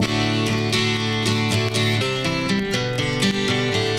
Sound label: guitar